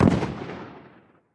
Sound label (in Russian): dalnij zvuk utilizacii starih snaryadov